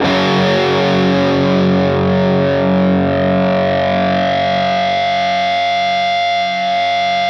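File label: fuzz chord, fuzz guitar vibrato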